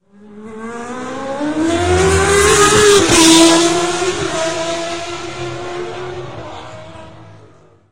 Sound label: Car